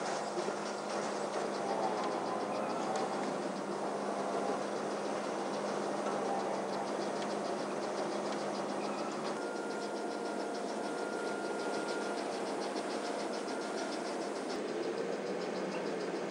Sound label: container; Rapid Gun; ipsc